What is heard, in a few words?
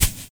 run grass